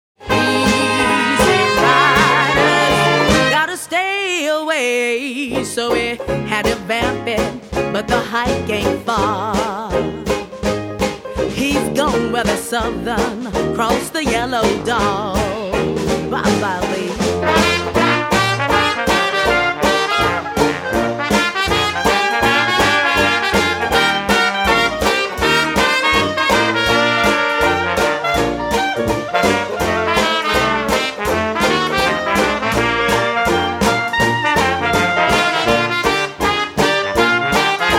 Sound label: blues